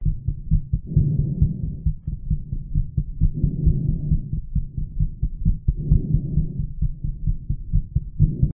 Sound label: heartbeat exercise heartbeat